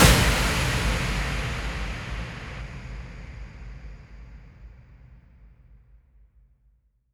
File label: Impacts, Effects